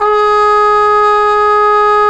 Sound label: BRS CPM TP, Cup Mute Dry; Roland L-CDX-03 Disk 2; Cup Mute Tpt